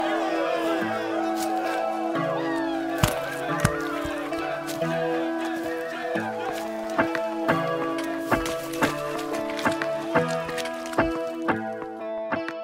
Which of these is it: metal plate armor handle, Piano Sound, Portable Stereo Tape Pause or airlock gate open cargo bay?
Piano Sound